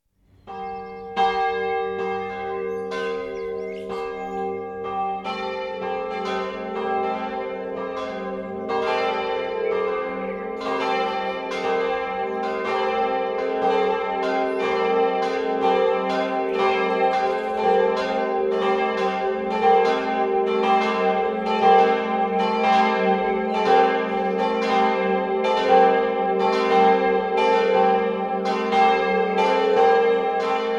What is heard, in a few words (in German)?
glocken